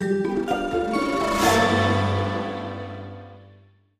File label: Level Start; Level Start Pearls; Scrubby Dubby Saga; Android